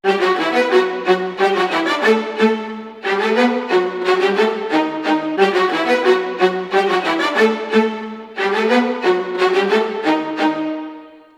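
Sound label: 90 strings theme violas + violins, E minor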